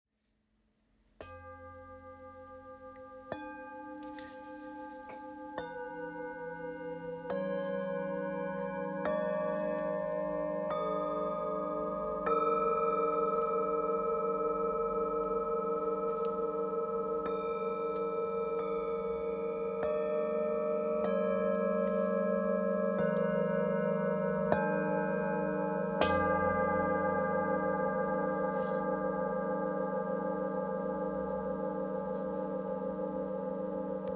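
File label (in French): Set, bols chantants tibétains; sensoriel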